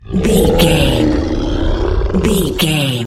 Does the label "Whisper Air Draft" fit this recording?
No